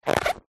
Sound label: cartoon rubber latex pants squeak; zapsplat